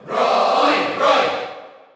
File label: Cheer Dutch; Roy Koopa; SSBU